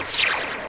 laser ricochet; g i joe

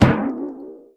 jelly bounce; Angry Birds Space

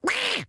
enemy duck notice

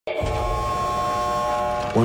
buzzer; squid game

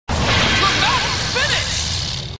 dramatic finish

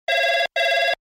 office telephone; foley

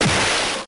explsoion

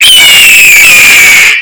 eagle cry

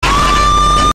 scream

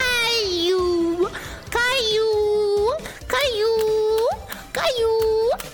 another fussy baby noises

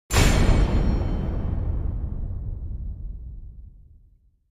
bang

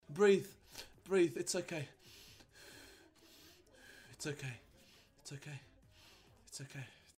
breathe

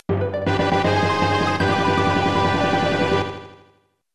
scenario item; lufia follow

